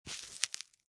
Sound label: VELCRO